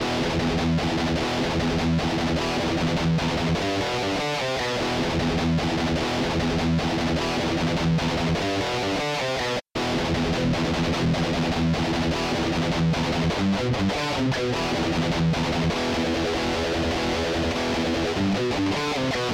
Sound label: Inconsistent Muting, Rhythm Guitar Playing; Tight Rhythm Guitar Playing